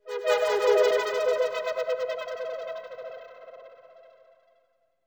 up shiver

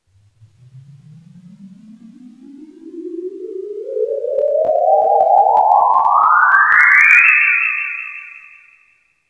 crescendo

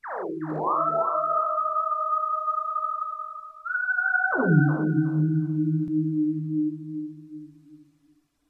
whoo - unprocessed

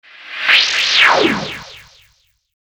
opening splat

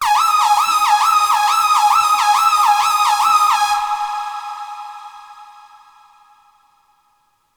squeaks

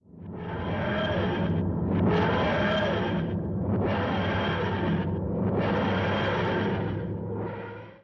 tardis left to right

